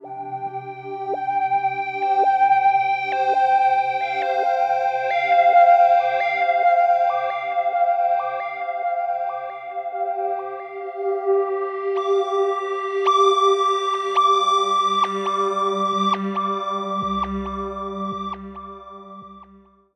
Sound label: Echoing Triangles CFGD, Analogue; Zero-G Ambiosis